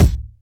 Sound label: crispy bass drum single hit b key, Royality free kick tuned to the B note; kick drum samples